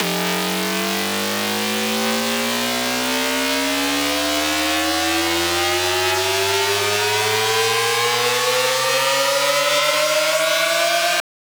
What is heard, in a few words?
Dubstep Riser 150 BPM F; FX; Risers; Cymatics; Dubstep Starter Pack